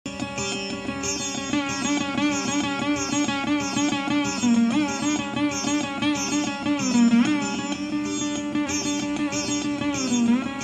Veena

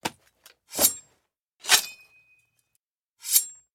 Sword Draw Sound

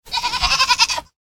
Goat sound

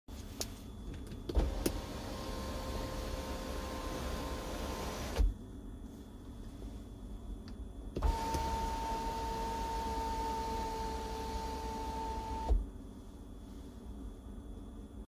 sunroof; سانروف ماشین

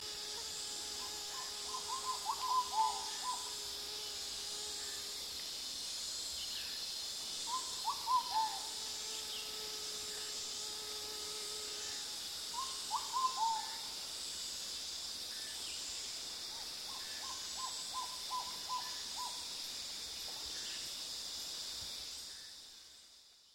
Ruddy pigeon; کبوتر گلگون

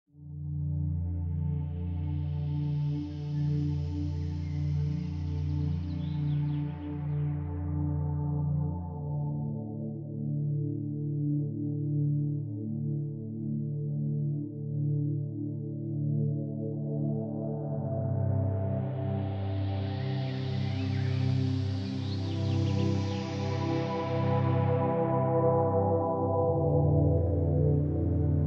Forest and piano

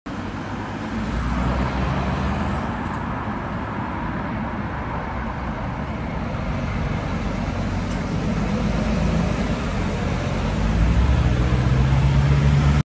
Benz bus

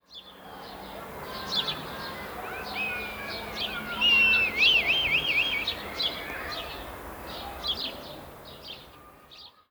Red kite; پرنده کورکور حنایی

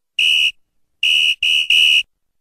referee's whistle; سوت داوری

